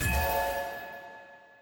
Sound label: Skill Templar Spell